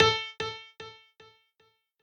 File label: piano sabi